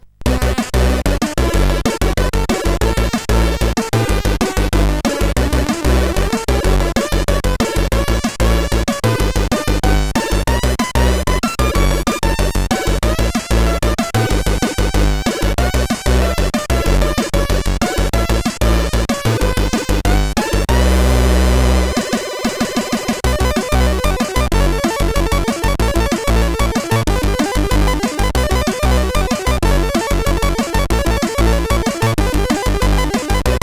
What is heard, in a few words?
Snare Intro, CSG8580R5; MCA